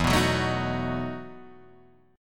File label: E Major 7th Suspended 2nd Suspended 4th, Guitar, Drop D